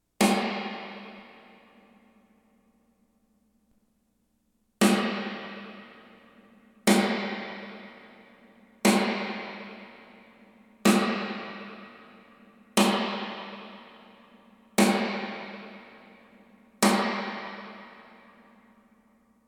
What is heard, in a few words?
room reverb 4.9 decay